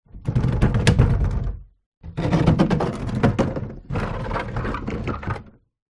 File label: household wheelie bin small movement on concrete ground